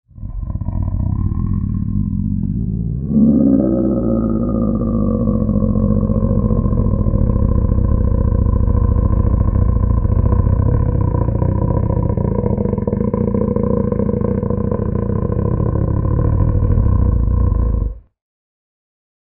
Cat Growl; Eerie And Lowered, With Reverb. Use For Monster.